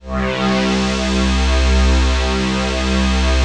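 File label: chord pad, Pads; Best Service; XX Large - Pads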